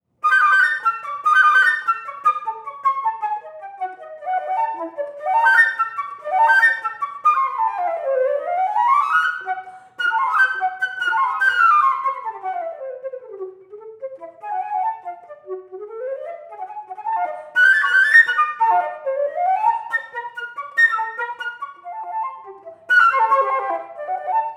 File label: Three Preludes for Unaccompanied Flute No.3; Robert Muczynski